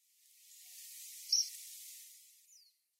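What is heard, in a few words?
Tree Pipit; koe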